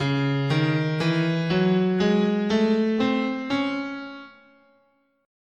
Db Harmonic Minor, scale

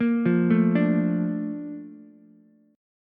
A sharp Major 7th, Ukulele; A#M7; arpeggiated; JGuitar

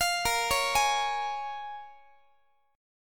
A sharp Minor 7th, A sharp, down, Guitar; arpeggiated

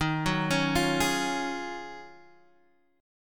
Eb Minor 11th Eb down Guitar Half Step Down

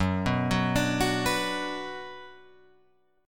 F sharp Suspended 4th Sharp 5th, Guitar, Open F; arpeggiated; F#sus4#5

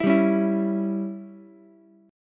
Db Diminished Db down Ukulele; strummed; JGuitar

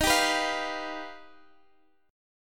G Augmented 7th

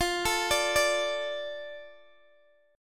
F 6th Guitar Open G, chord; x,x,15,14,15,12

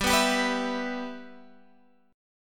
B Suspended 4th Sharp 5th G

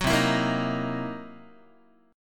C 7th Sharp 9th Flat 5th, C, down, Guitar; strummed; 9,8,7,8,7,x; 4A,4E,5A,5D,5G#,6C#; chord; JGuitar

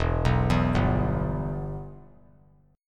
E-Minor; arpeggiated; JGuitar

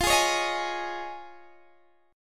F Minor 7th Sharp 5th Guitar Open D, chord; down; chord shape

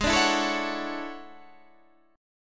E 13th G sharp

